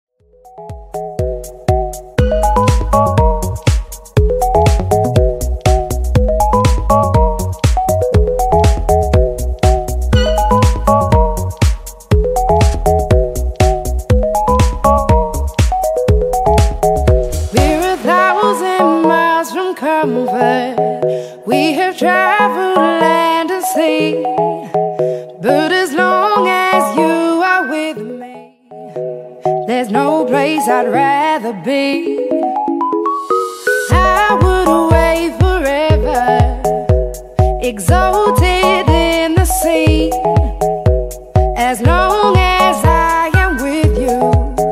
Top40 Extended 121bpm

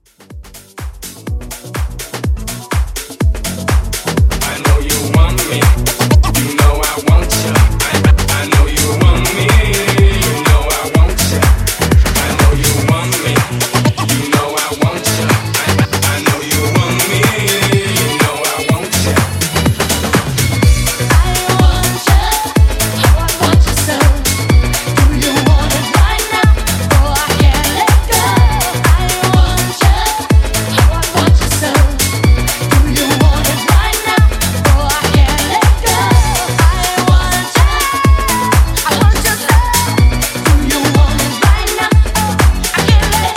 House Mixshow

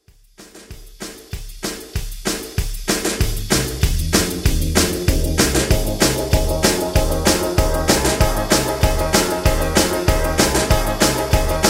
50s Redrum

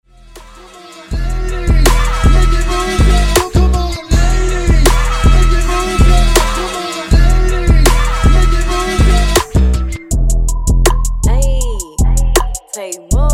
Hype Twerk Mix 80Bpm

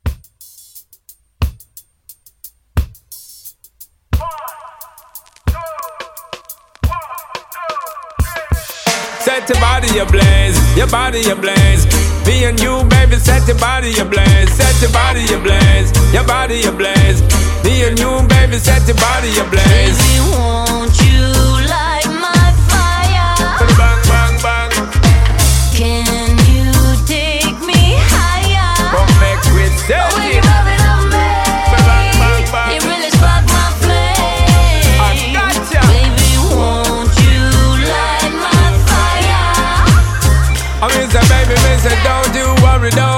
Reggae Edit; DJ Intro Outro

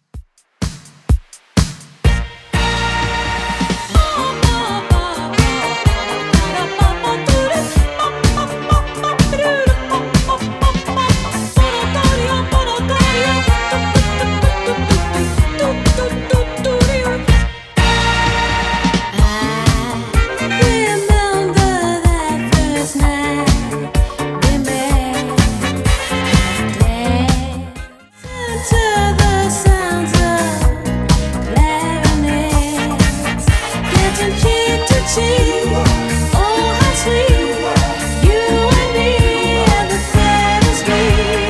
80s Redrum 126bpm

